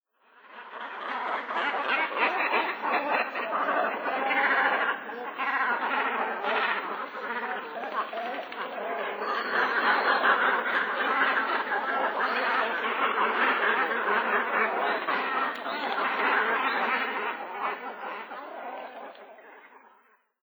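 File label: Great Cormorant, Sano; kawau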